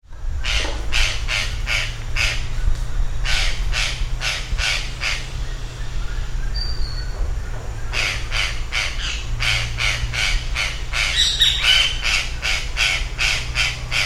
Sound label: Cyanocorax cyanomelas; Bonito; Brazil